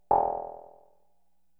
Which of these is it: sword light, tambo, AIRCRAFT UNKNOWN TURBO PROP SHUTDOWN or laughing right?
tambo